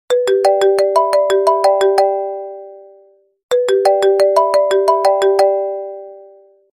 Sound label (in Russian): melodiya ayfona